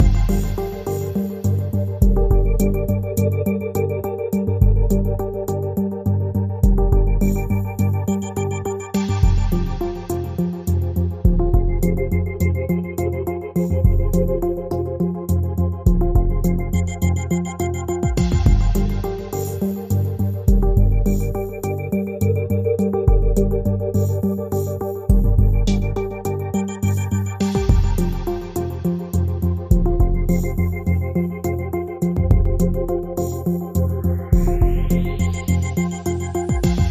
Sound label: Lounge